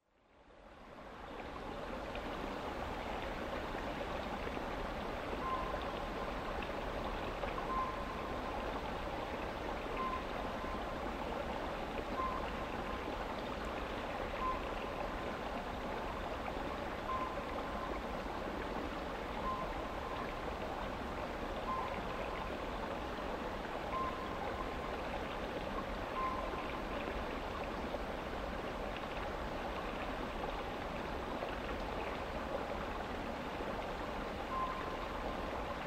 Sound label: pygmy owl